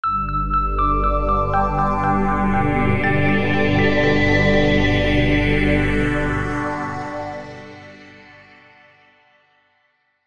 multimedia musical ident signature soft warm airy optimistic